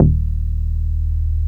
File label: GRAND D#1, BASSES